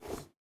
inventory info appear

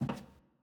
Footstep Wood

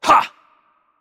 Attack Heavy; bloom minigame

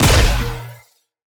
Attack Jump Heavy Pre; bloom minigame

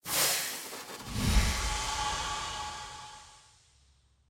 Pipe Success

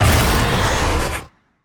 Enemy Attack Heavy Cast; bloom minigame

